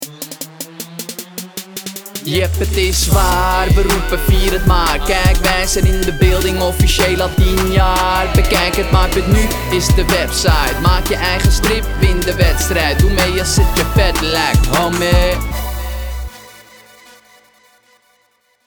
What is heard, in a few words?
Rap